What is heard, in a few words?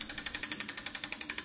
Cat launch Move holder